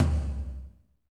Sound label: tom, lite jazz, Roland